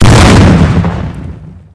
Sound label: grenade